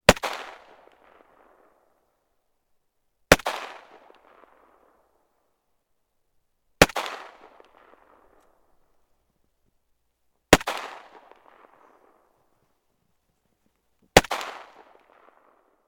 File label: gun ak 47; still north media